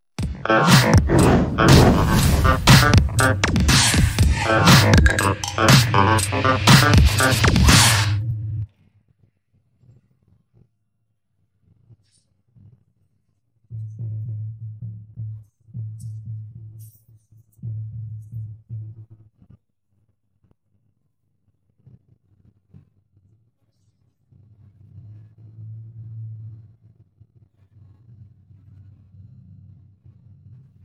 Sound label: no vocals